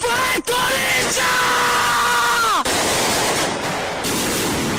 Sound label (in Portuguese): vai curinthiaaa fogos boosted